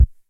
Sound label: single reel stop